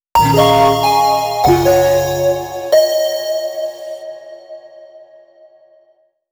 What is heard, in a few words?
chord; lead arp chord melody